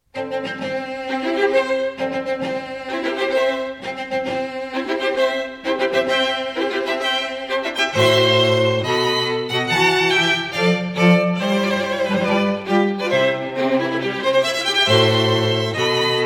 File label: Wedding Recessional, string quartet